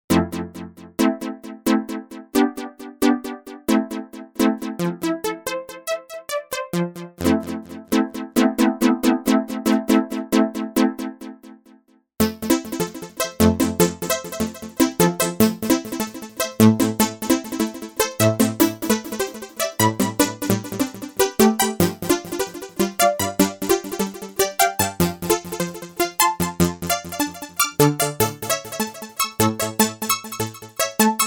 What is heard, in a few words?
Sharp ARP, synth